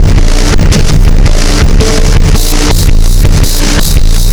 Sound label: System Overload Beat, Processed Drumkits